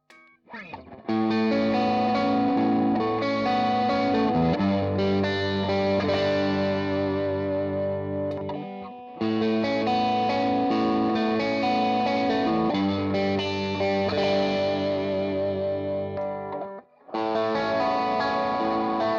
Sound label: Picking OD TS9